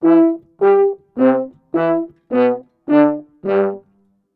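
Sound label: 105 brasses, Orchestral arrangements, A minor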